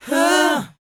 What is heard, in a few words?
BOYZ D#, Vocal Planet R&B & Dance; ILIO